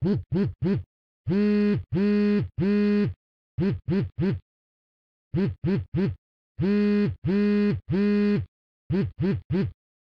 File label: phone vib sos; devices accessories utilities; NS Studios - ultra sound collection